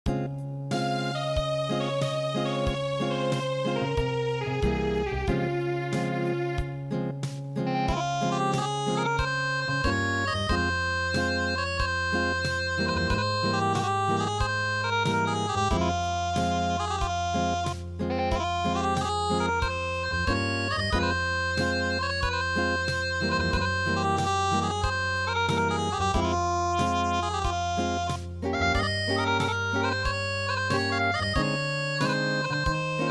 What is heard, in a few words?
Acoustic